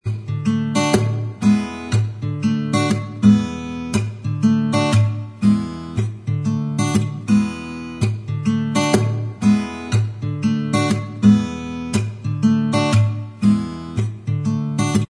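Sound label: acoustic, instrumental, backing track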